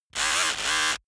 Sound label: servo small buzz fluctuate short